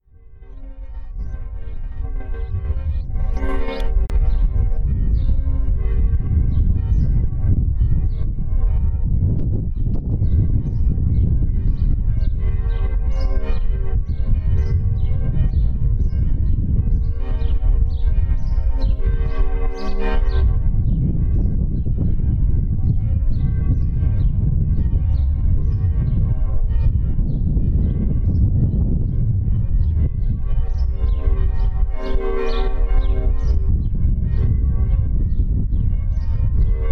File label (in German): Glocken Stadtkirche; Rothenburg